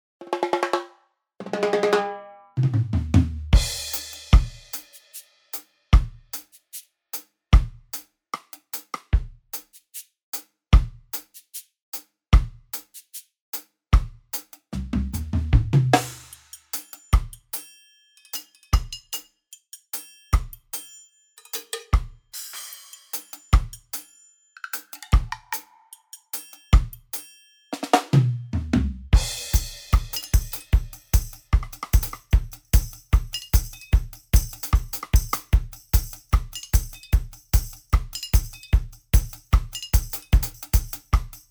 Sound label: drums reggae standard; gorangrooves; handy drums